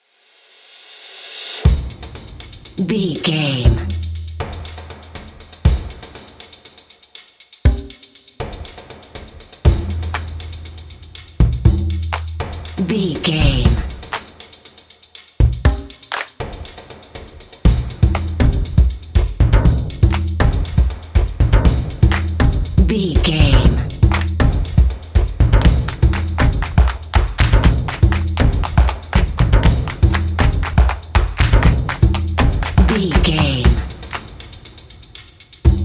An upbeat, energetic, and engaging percussive track featuring drums, stomps, and handclaps in a pulsating and intense rhythm.